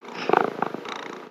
Pet Cat Purr; creatures pets; Lego

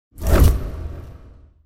torch by oneshot

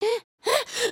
sneeze

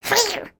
Trex Excited Roar; creatures organic

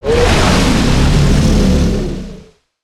Fire Breathing; creatures organic

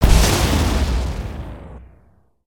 Maelstrom Snowman, destructibles

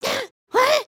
freak out, emotes

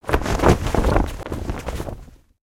Flag Flap; ninjago

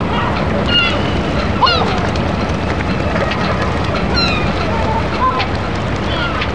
ocean light loop

